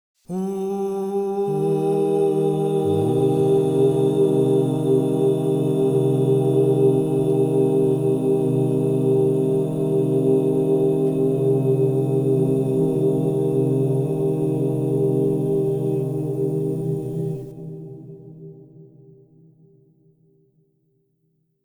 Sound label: Do minor, crystal bowls; Yoga and sound